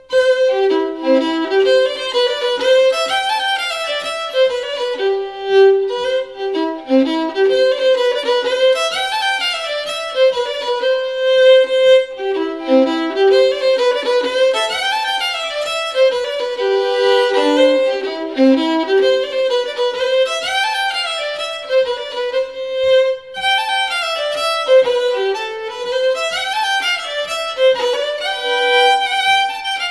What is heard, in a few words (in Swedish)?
Polska; Härjedalen; Polska e Jonk Jonas EÖ587